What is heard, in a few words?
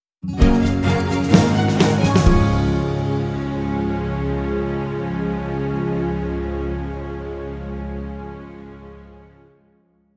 Sting No Electric Guitar